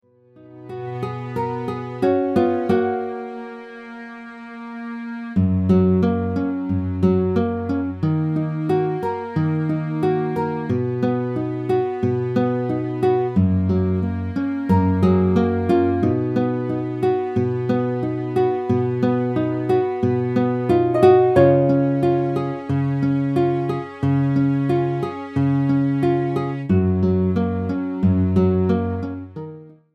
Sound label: BACKGROUND MUSIC WITH FEMALE HARMONY AT END